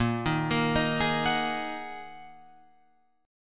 A sharp 7th Suspended 4th Sharp 5th, Guitar Open F chord; arpeggiated; JGuitar